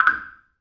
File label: Ui Ok; The Croods